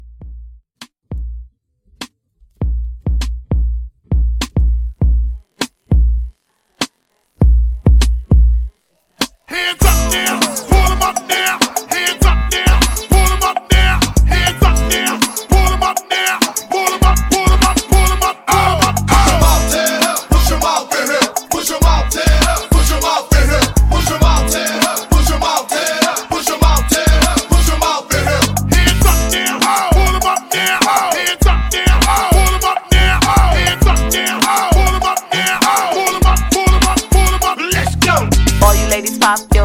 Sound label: Party Break 100